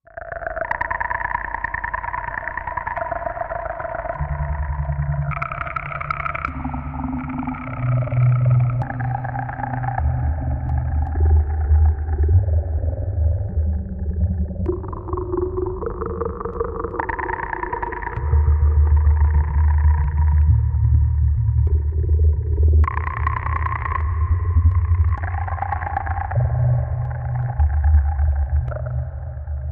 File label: Medium Electric Pulsing Echoes Over Low Tone; Frogger Medium Electric Pulsing Echoes Over Low Tone Froggeres; probes